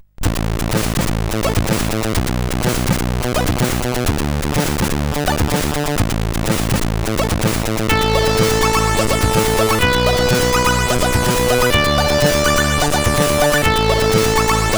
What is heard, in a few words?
Train sid CSG8580R5